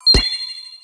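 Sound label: attack ribbon; Arknight voices; player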